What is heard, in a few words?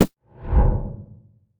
noxious trap; LoL; teemo